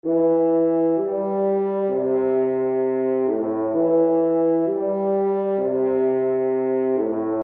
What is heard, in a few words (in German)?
blasinstrument langer ton